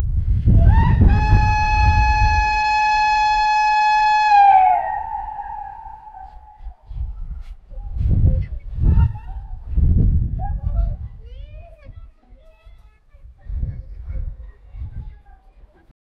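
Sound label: norwegian police siren sound; norvegianpolice; law enforcement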